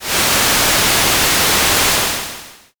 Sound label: rain